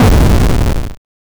effect for 2d game like, 8bit

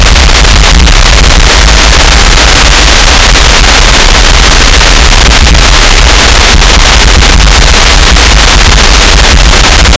sound of heavy rain in, forest

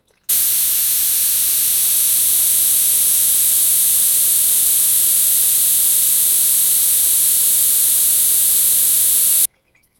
steam pipe rupture and

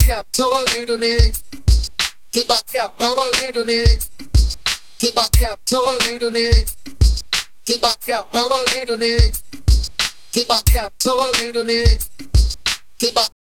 something hip hop in the, beats

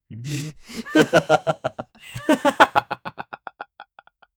two human voices laughing; laughter

